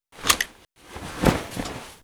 Inf Weapons Foley; Red Orchestra Ostfront 41-45

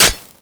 pick ice snow

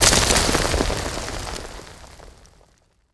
ceiling debris

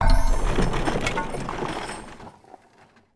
Build Egyptian War Chariot; Civ4 warlords

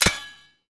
trigger no ammo

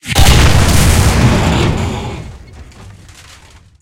tank hit big

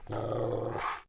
wolf heal vox; Civ4 warlords

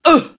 Elder Exert, voiceovers; english

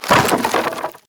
objects, World of Tanks

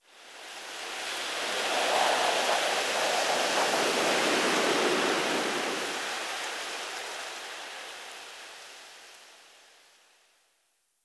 wind leafy whistle

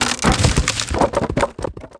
fence beam; World of Tanks Blitz

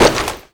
foley gear land; Call of Duty Modern Warfare

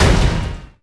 Gunship Hurt; Civ4 warlords

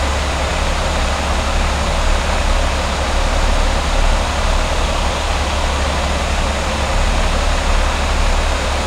T80 Engine Idle; DCS

